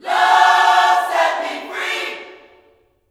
LUV FREE, Vocal Gospel; Vocal Planet Vol1 Gospel

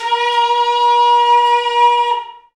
Bullhorn, Afro Horns, Africa

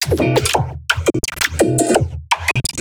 foley maker loop

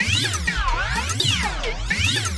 Frog Vibe; Sonic Foundry (Sony Creative Software); Hydroponic Hip-Hop

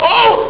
ough; Amiga Soundtracker sample

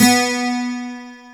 12STR, AC.GTRS

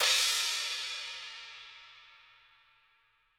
crash, cymbals; Dusty; RoBKTA Sample Pack Supreme

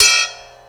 Clank Choke, Cymbal; KJ Sawka; Drum n Bass & Beyond; Drum Tool Box

